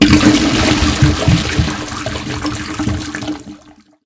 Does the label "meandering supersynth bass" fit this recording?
No